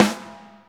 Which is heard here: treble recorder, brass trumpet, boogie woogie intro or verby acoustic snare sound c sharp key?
verby acoustic snare sound c sharp key